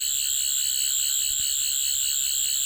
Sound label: Sonic Screwdriver